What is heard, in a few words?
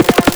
DRUM